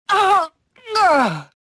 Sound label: death; mothma